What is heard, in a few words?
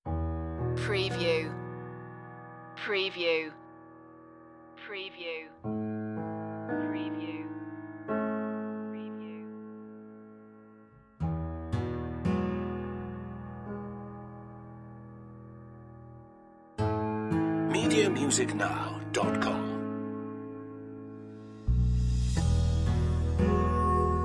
beauty and calm no percussion